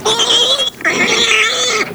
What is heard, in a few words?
pig mono